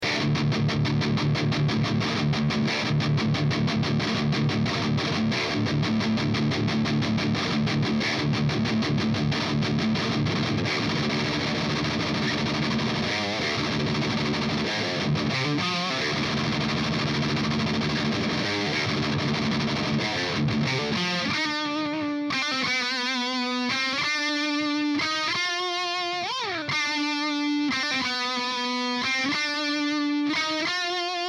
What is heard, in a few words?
Bare Knuckle Pickups Aftermath Rectifier